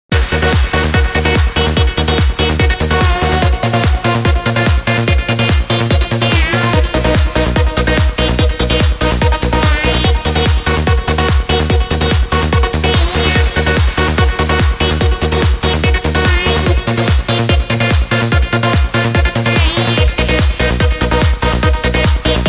Cool Electro

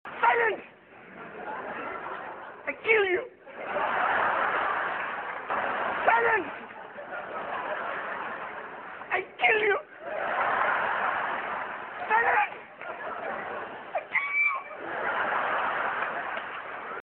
Silence

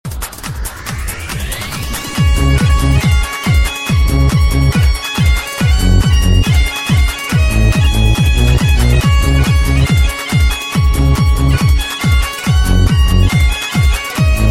Scottish Carnival

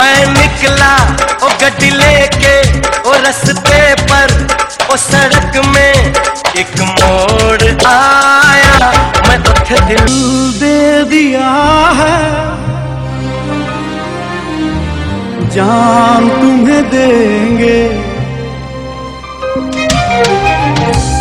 Funny Mixture

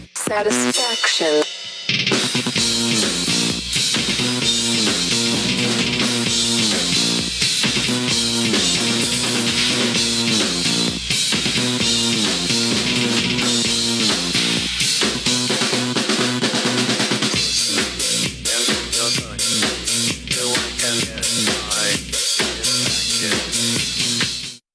Metal

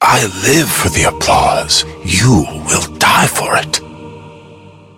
applause; Jhin

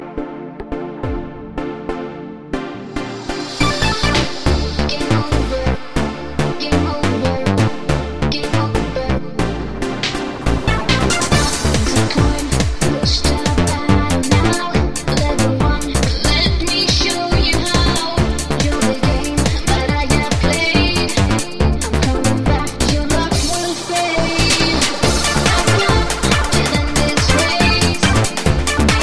Game Over

